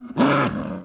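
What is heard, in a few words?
panther attack vox; Civ4 warlords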